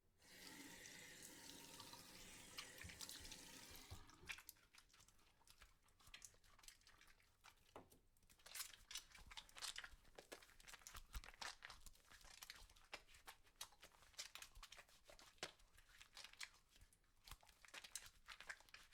washing hands water off

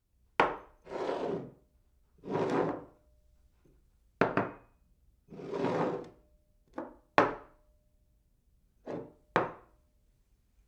glass sliding on table

